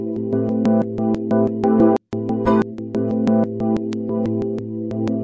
092 stuttery clicky epiano chord; SAMPLED MUSIC LOOPS

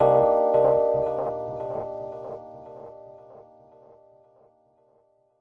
stereo epiano chord; single samples

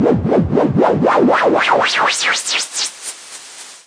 noise Modular, Noise Sweeps

